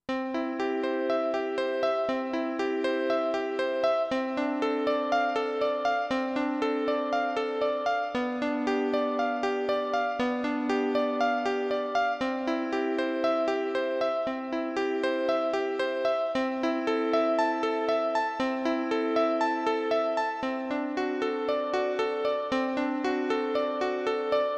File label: Electric Grand Piano KSP, Yamaha Clavinova CLP-270; I SUONI - GLI STRUMENTI XG